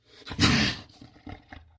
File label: hit, monsters, cat; Soundscape Overhaul